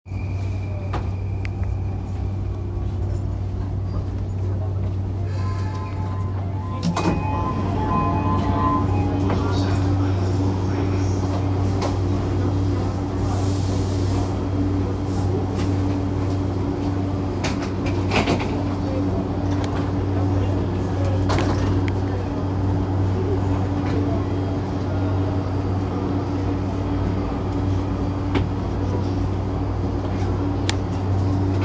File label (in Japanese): JR山手線東京CW, train sounds